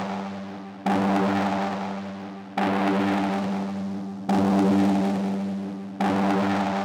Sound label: Noizy Synth WET 140BPM, G minor; Melody & Synth; Vengeance Samples; Space Of Time Kit